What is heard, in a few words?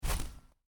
Footstep snow boot, Boots: Individual Footstep On Snow, Heavy; Human